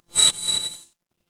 magic scifi hologram

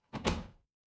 door close

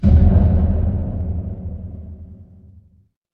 transition braam

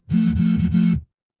office phone vibrate

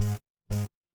feedback error

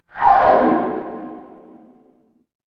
transition sweep

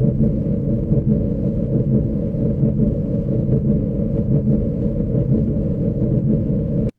mechanical machine

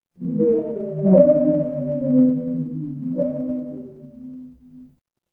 horror ambience dark

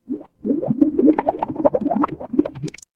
water bubble

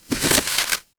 paper fabric crumple

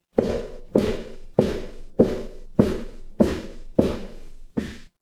footsteps concrete

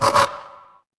feedback error

